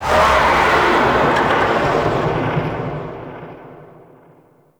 Distant Oni Vocal

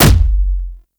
Explosion Punch Generic Large; Explosion Punch Layers; Weapons

